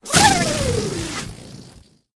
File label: Globe Bird Death Remove; Angry Birds